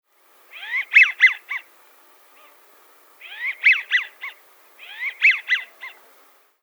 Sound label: Voice Wigeon